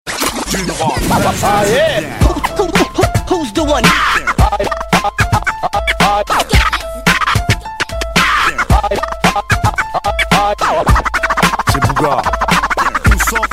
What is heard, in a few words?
Dj Effect